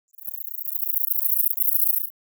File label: Neoconocephalus affinis unclipped